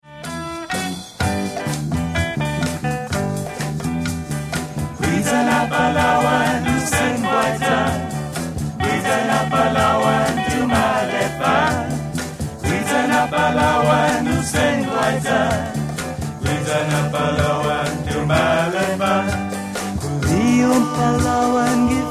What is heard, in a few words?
Palauan